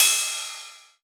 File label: Rides, Cymbals